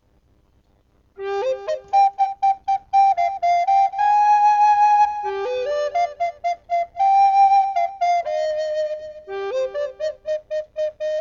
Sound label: alto; A Casinha Pequenina; MelBay